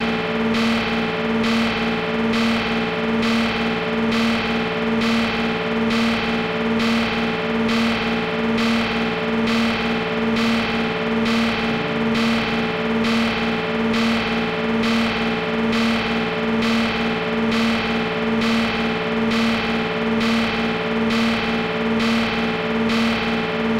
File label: Guitar Machine Loop E